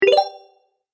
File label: Health Boost, video game